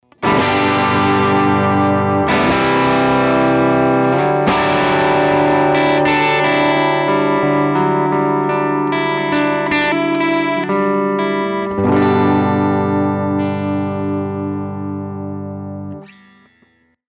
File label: spruce bridge class a30, guitars